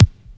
80s bright kick drum one shot a key; kick drum samples; drum single hits one shots

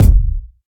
reverb bass drum single shot a sharp key

